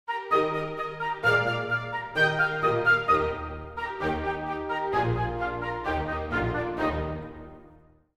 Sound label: Classicism ringtone